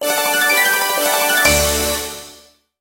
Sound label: celebrate